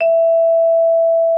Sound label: VIBES E5; Xsample VOL-4